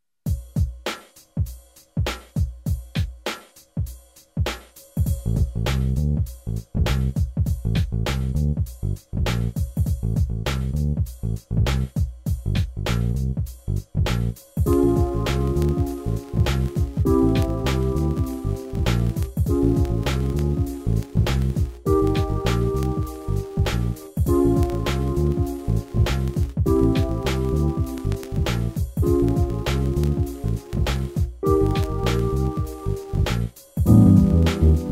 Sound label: poetry beat